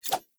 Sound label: Dagger Whoosh; Sinecure Dagger